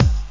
kick; kicks

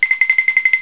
tiptoe; Blender Magazine volume1.3 1994; moogly

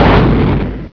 Reached Bottom; FRAC 1.0

